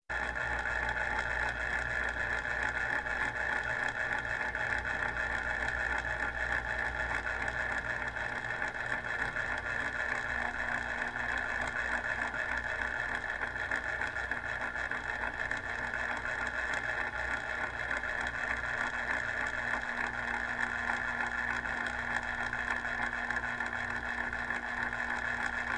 Clock Electric Old

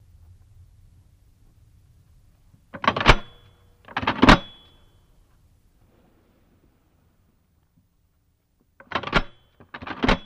Levers Latching Dbl, latches & bolts

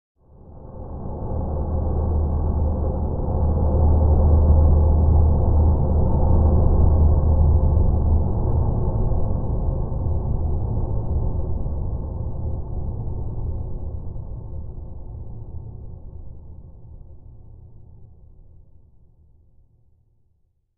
Bed Galactic Flutter

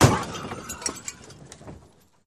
Auto Crash Lrg Head, car crashes

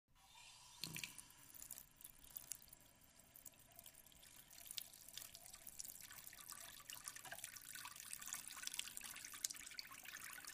Sink - Water Running Filling Sink, Kitchen

